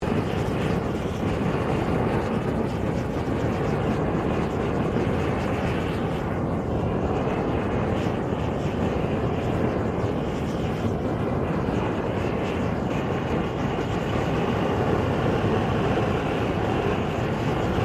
TORCH GAS FLAME OPERATE LOOP, small gas blow torch; Machinery (Sound Effects:Machines)